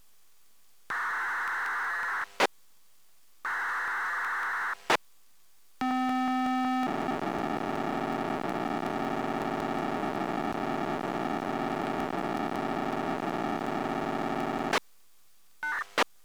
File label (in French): declenchement, signaux